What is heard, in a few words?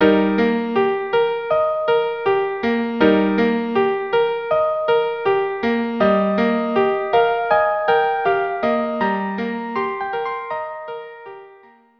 violin duo; intermezzo; carmen